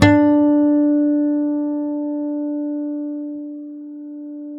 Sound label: STEEL HARM D, GUITARS; Synclavier Sampler Library